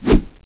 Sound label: flip, weapons